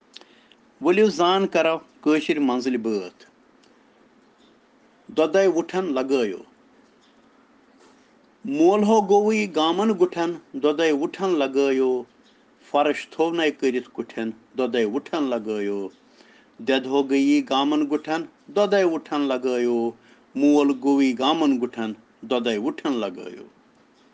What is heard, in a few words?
Children Rhyms in Kashmiri